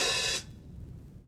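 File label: Vinyl Open Hat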